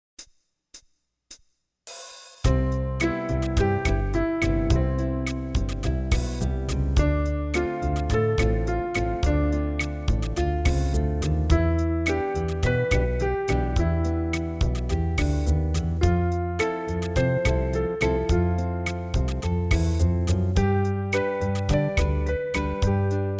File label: Study In Broken 3rds Slow